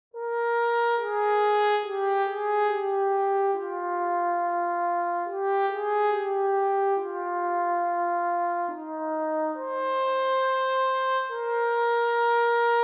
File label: sea monsters brass trombone